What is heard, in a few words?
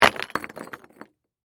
Crash, Rock, Debris, Small, Dump; Rock Sound Effects; BlastWave